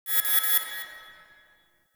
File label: Dojo Tech Beep; Warframe; Mod Quest; Teshin's Hideout